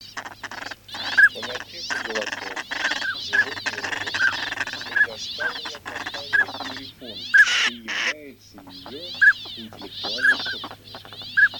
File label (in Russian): Шилохвость микс Codone mix; plurifon